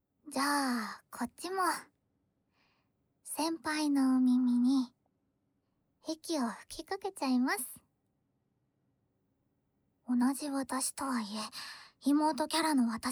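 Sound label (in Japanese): お耳に、息を吹きかけちゃいます（耳吹き右）; 先輩のお耳に、息を吹きかけちゃいます（後輩として耳吹き右）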